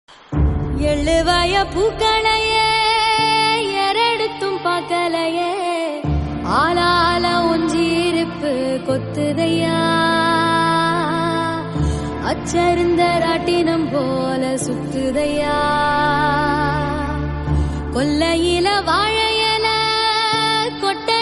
rip song tamil; asuran

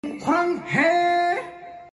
marne wala sound effect fighting sound effect

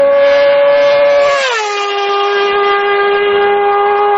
sports bike

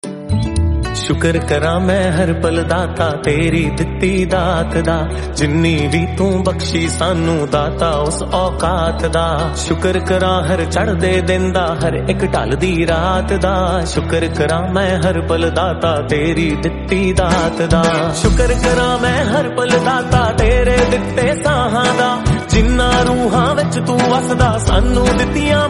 devotional, Punjabi

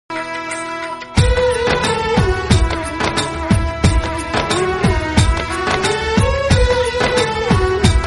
flute cover instrumental bgm; krishna

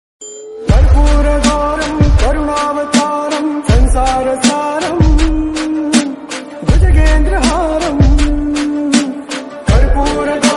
bholenath song

